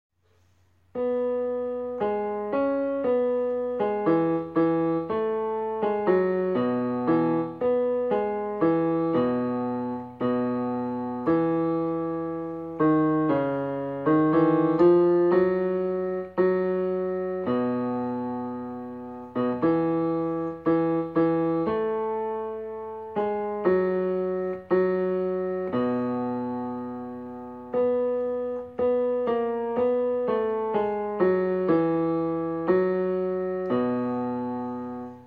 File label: bass plonked